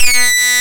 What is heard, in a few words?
FIX SYNC, ARP Synths